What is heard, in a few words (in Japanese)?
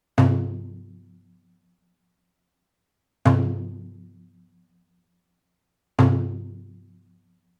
taiko